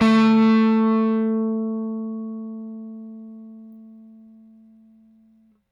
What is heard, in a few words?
Rickenbacker Bass; Airwindows